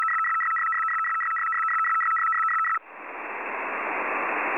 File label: fsk100